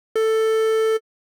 Wavetable Didgeridoo; Pigments

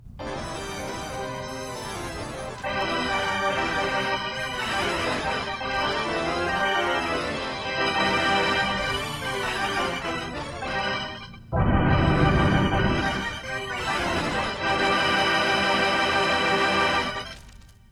organ; preset Instant Mistery

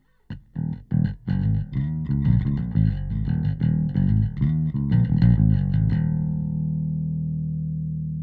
bass

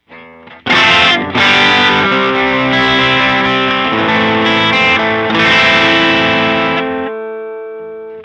guitar bypass; INTENSITY

